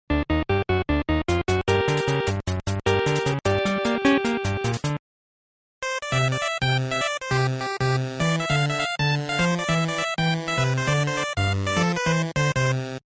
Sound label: tablet song